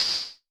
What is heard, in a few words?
HH, Vermona Mono15 Drums